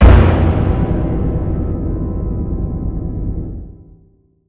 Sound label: Jump scare